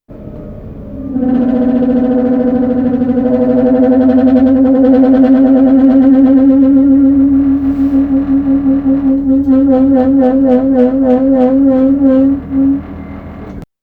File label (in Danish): bremser; MQ nedbremsning; 2019, Indkørsel til Ejby mod Odense; Kristoffer A. Pouls